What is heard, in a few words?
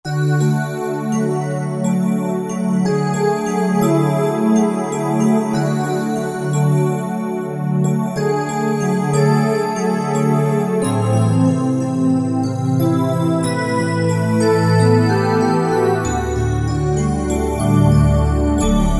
Roland JD-990; Fantasia 990; Factory Patches examples